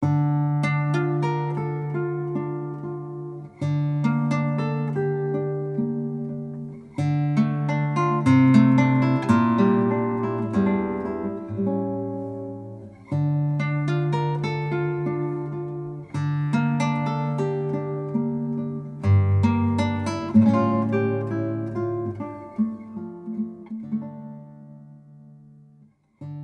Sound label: Guitar Recital; Gitaarboek